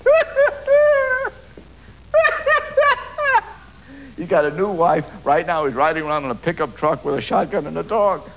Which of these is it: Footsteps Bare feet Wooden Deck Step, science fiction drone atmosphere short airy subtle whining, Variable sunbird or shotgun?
shotgun